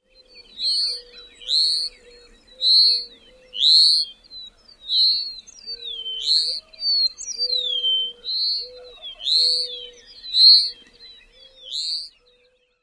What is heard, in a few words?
interaction calls